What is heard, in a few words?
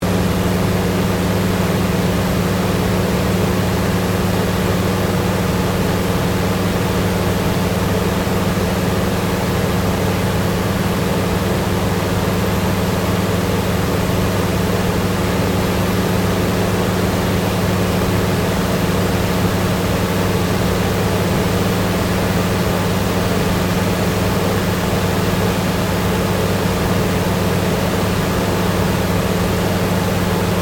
AIRCRAFT CA-13 BOOMERANG FLYING INTERIOR STEREO; Airplane Sound Effects; Vehicles